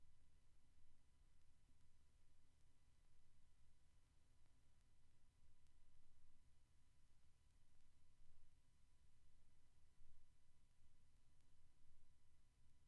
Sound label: Silent Groove, Turntable; Audio-Technica AT-LP120-USB Turntable; Ultimate Analogue Test LP